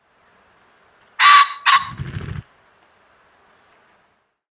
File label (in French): faisan de colchide